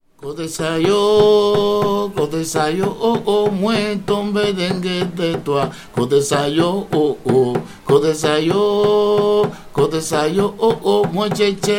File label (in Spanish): merengue